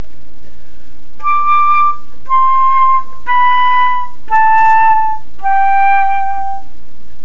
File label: Correct release, flute